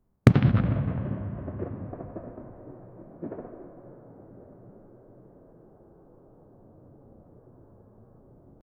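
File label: outdoor gun explosion burst, reverberant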